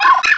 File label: Cry; Pokemon Essentials; Pokemon Iberia V1.03